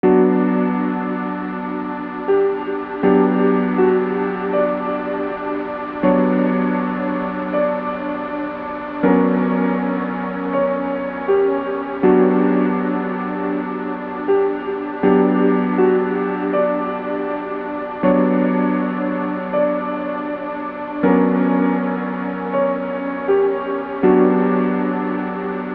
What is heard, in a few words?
piano